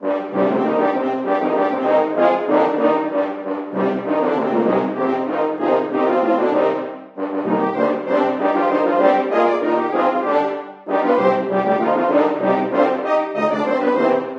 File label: Horn